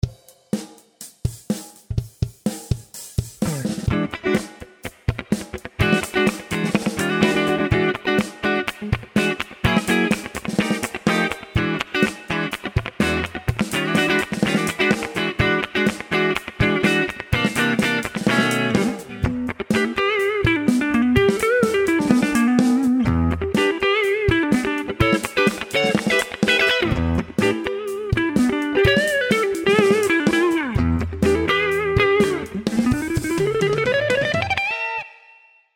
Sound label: Clean funky neck